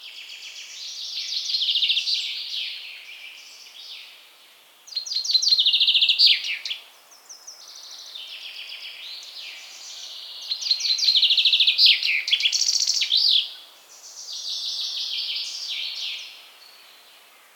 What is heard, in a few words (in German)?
buchfinke